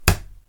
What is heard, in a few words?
dodge ball; sports